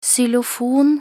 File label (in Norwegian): xylofon